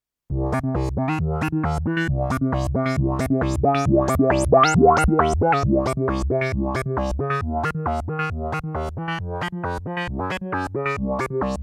microfreak V4 Presets

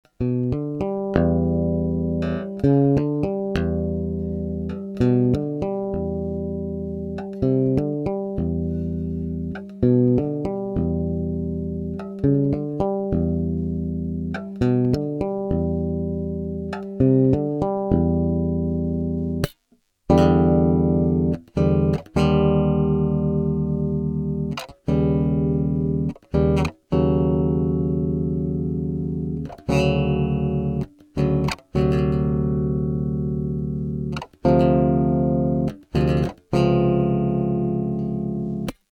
spector j high boost

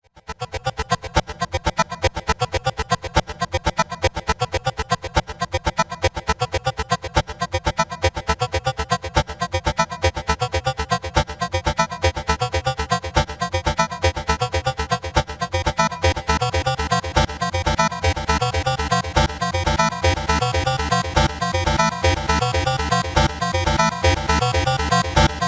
Sonicware ELZ 1 Delay Sequenz FM Engine

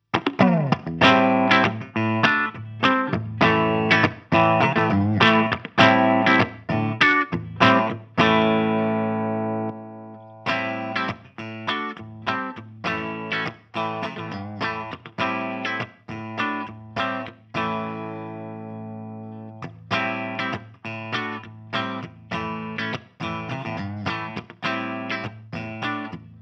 6 string Both Pickups 5 way switch; BC Rich The Rich

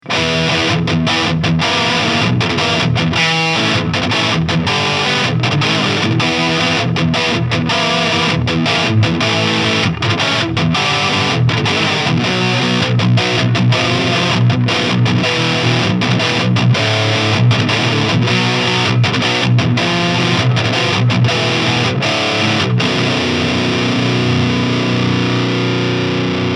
Solar Guitars V2.6FBB Baritone Lead

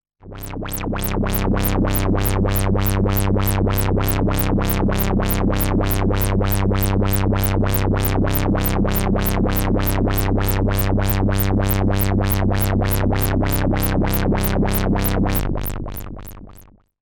Behringer K2 Resonanz 2 kHz LFO VCO12 SAW

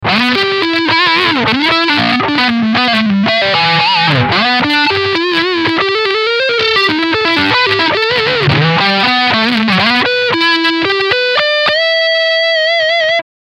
Front SC Leadline

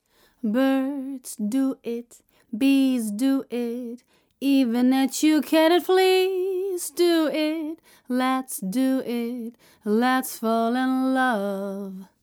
Vocal Tube X EQ De Ess Tube

